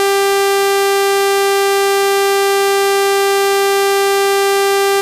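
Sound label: sounds beep beep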